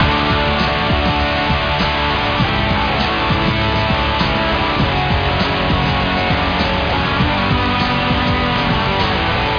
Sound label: rock